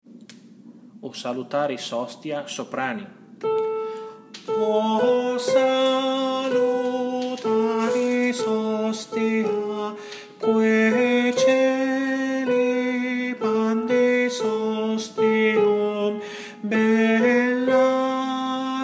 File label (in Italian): Soprani; O Salutarsi Hostia